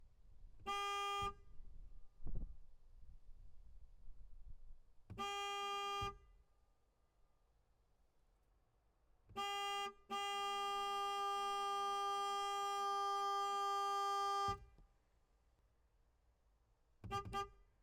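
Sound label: Alfa 4C onboard foley horn interior; Alfa Romeo 4C 2016; DPA4061; Pole Position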